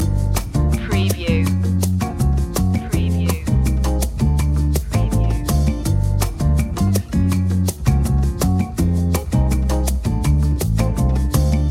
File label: breezy night lounge loop